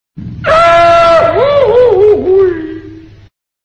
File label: goofy yell